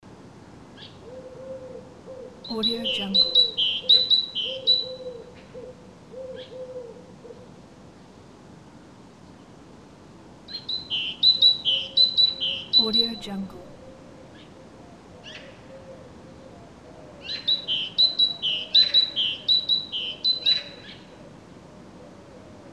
birds in forest

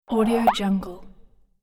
retro game fx

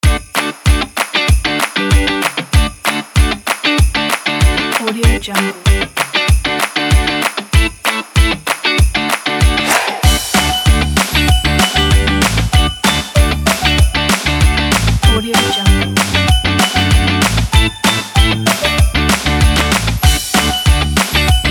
jingle bells kit

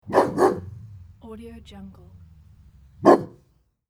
big dog bark

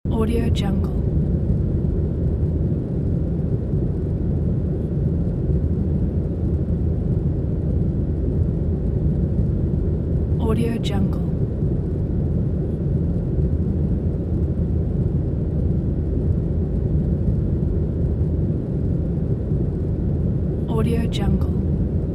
airplane ambience; sviridpavlov

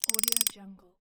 strapping tensioner ratchet single

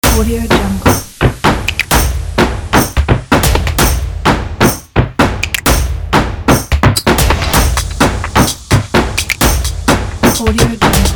sport stomp

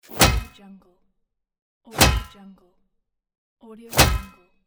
metal punch; absinthemedia

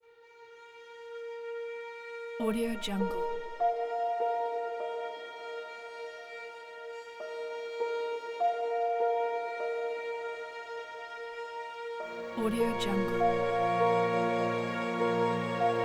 tenderness love pain parting piano and violin

